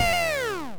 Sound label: MOVE; C64 Dreams; Multi-Sound Default